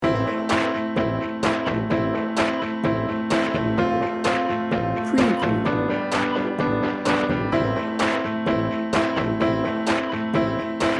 adventure junkie

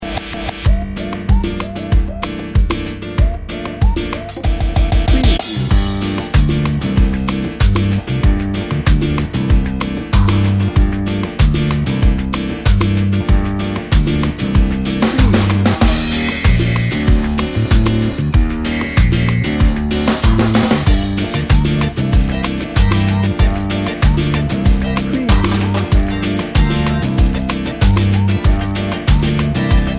samba party bed

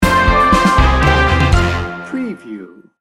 broadway bound sting bumper

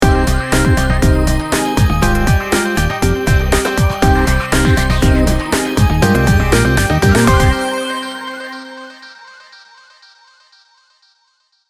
energetic motion sting bumper

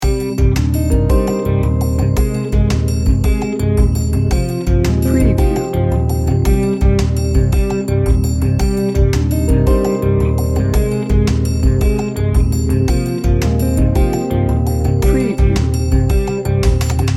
swampy tension loop

